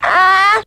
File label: "ah"